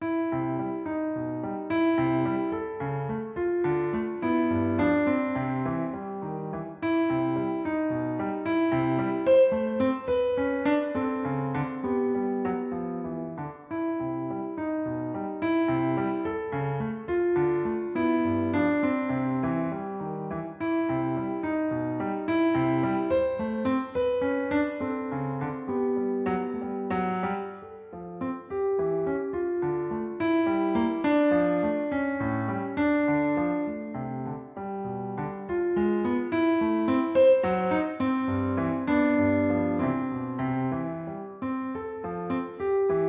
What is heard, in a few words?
Melody; F. トーメ; メロディー; 「ピアノ レッスン 6」(ヤマハ, 1996)掲載の楽譜で演奏しました